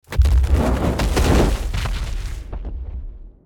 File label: fire Element Wild Spread; elements